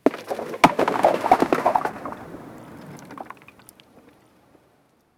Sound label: a rockslide tumbles down, mountain